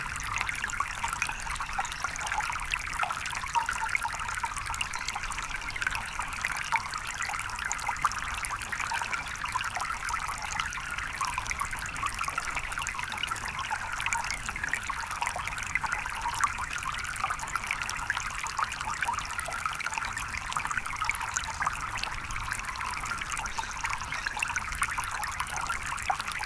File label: calm clear sound of gentl, consistent